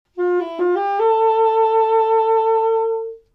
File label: saxophone break